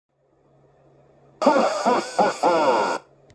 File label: haha